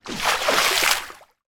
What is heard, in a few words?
water movement fast; sound effects one